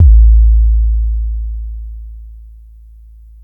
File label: SUB, Bass Analog & Synth; SUBTOMMY; USB Soundscan vol.12; AKAI